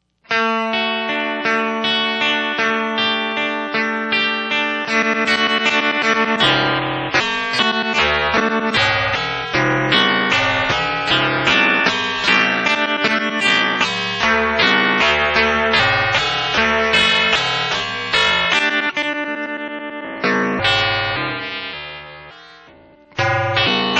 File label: ring